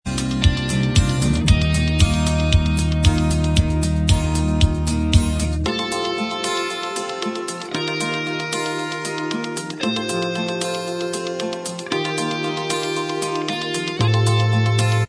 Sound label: Inst Track, backing tracks